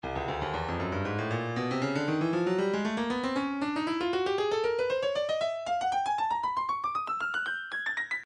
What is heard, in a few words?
breakbeat 117 bpm; Sizzle A; Stylus Breakbeat Midi Files